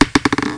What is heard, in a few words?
down; Abalone 1.4.2